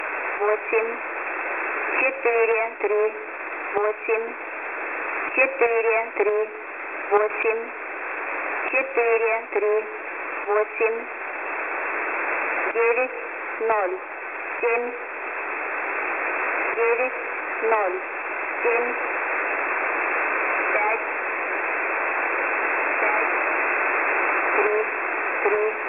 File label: 16945.0kHz; number station; web SDR; dash number stations recordings; dash recordings from web sdr